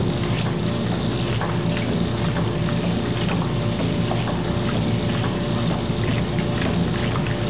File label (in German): wasch